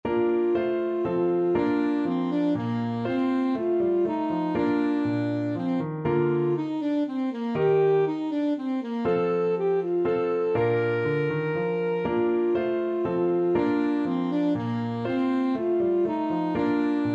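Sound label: minuet, alto saxophone; bach; bwv822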